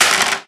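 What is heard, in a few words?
physics clipboard impact small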